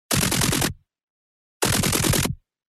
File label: Assault rifle